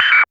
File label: Synth Shots; Vengeance Samples